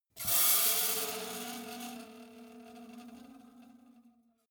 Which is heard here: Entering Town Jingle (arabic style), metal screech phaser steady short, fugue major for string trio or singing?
metal screech phaser steady short